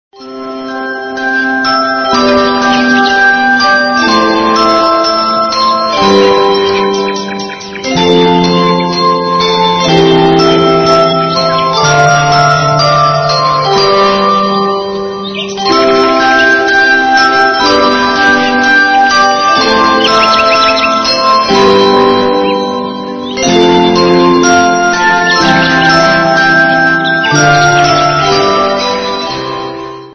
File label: Soft ring ring, ringtone; Sms ringtones